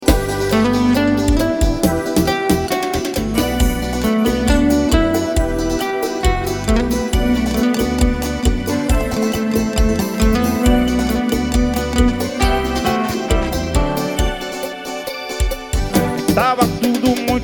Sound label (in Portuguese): seresta ao vivo; tuparetama